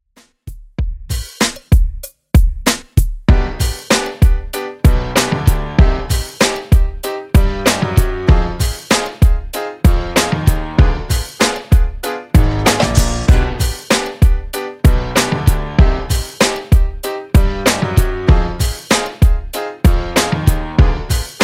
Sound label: Throwback Redrum 96 bpm